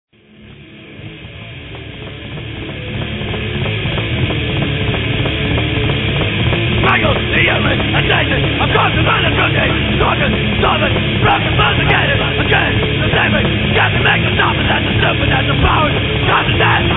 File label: death metal